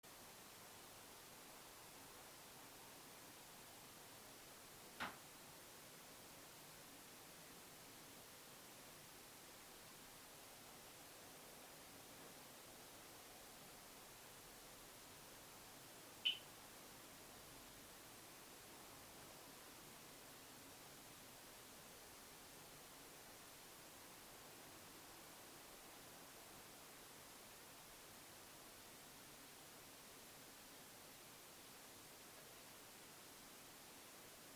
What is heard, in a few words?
dragging footsteps on stairs; Devoe House; EVP